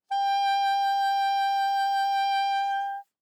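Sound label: Soprano Saxophone vibrato ff G4; Woodwinds; Bb Soprano Saxophone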